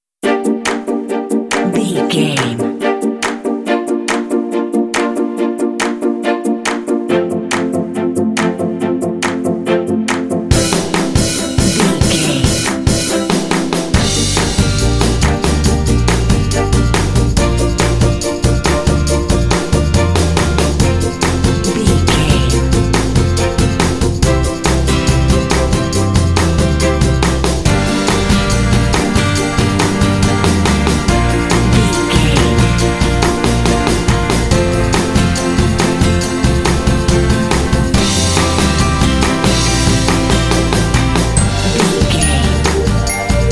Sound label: driving upbeat positive energy bumper